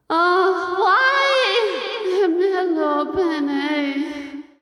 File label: Flower Destroyed; Grey Mourner